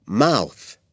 MOUTH

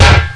steel barrier; Driver

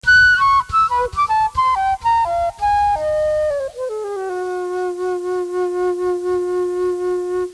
flute; PUPPIES

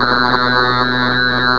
Sidewinder Growl; FALCON MC

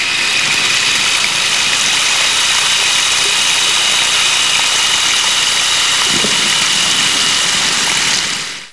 rain

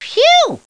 PHEW, CARTOON VOICES; Sound Effects for Multimedia - Volume 2

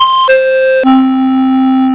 Clarinet; Amiga 8-bit Sampled Voice

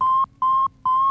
Red Alert; Peripheral Empires

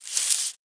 make baskets; Immortal

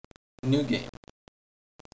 new game; Netbattleship

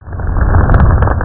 Flame

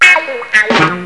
guit line, techno stabs; Terra Sound Library

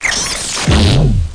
Fart; RoleAid

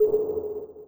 SONAR; Cybermax

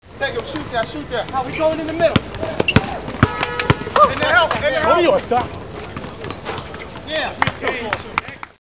ball; TOYOTA

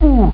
uuuuhalf!

